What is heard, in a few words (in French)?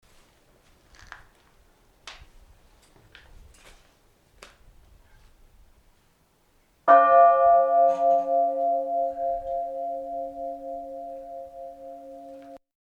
cloche; Peyrusse